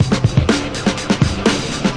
122 bpm, breaking the bread, drum loops and breaks